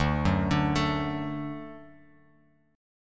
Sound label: D Major D down Guitar Open F; D arpeggiated; JGuitar